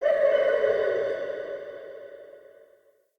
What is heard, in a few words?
Cave Mysterious Distant D, Ambience, Shrine Cave